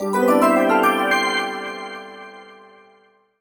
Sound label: points ticker bonus score reward jingle